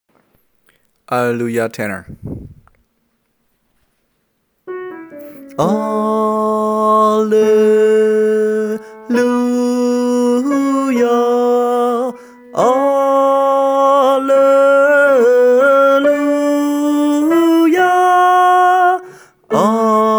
Alleluia Tenor

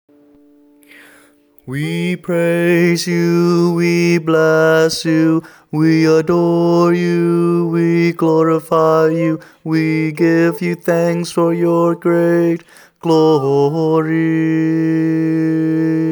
Glory verses Harmony

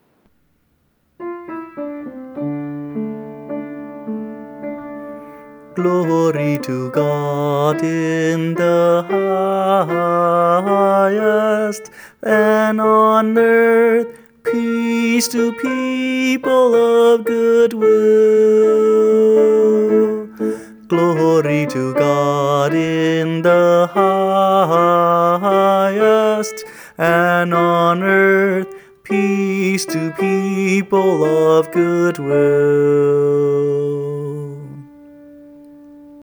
Glory Melody

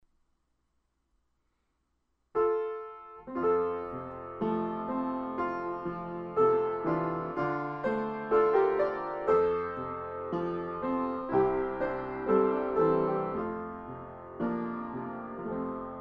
Piano

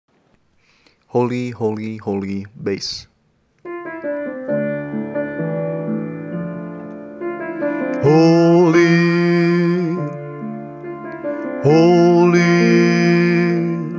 Holy holy holy Bass

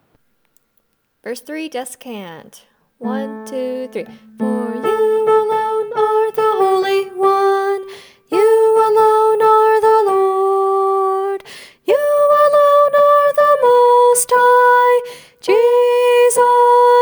Glory Descant

